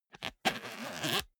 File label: zipper small case open